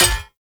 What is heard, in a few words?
Hammer Impact Parry; Weapon Impacts; Player; Combat